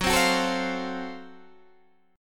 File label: Gb Minor Major 7th Flat 5th, Guitar, Standard, chord; strummed; 14,15,16,14,13,13; JGuitar